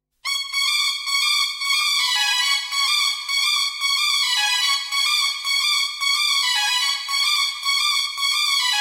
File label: Punjabi Ring